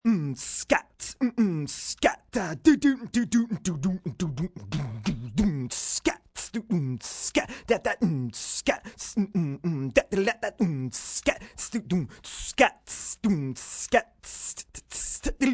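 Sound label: Beatbox, Vocal drums, rock beat (human voice); rock beat 2, instrument; Singing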